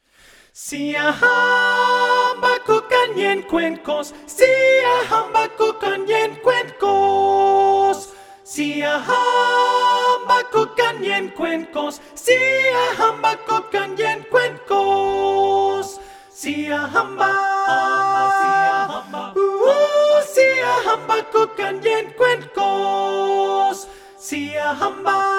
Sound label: siyahamba (soprano)